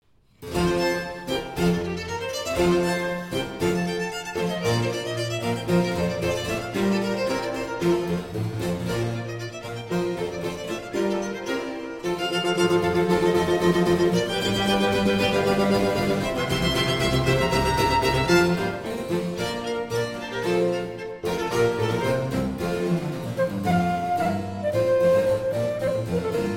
Concerto For Flute, 2 Violins and Basso Continuo in G Major, Allegro